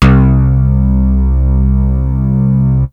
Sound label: moog; basses